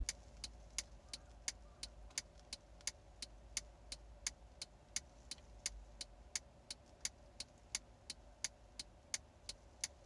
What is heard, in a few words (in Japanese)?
もっとも心地よいウインカー音